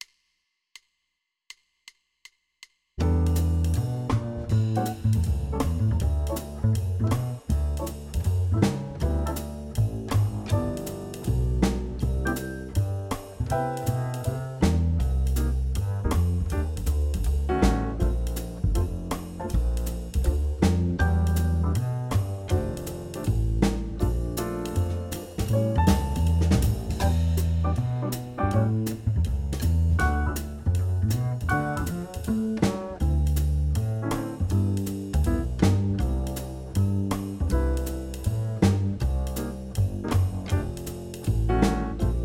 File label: Jazz Etude; track only; Etude #3 track only